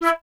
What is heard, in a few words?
FLT FLTE, Flute; Miroslav Vitous - Solo Instruments VOL-2